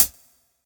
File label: subtle reverb high hat sound f key; hat samples